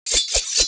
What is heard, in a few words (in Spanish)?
salto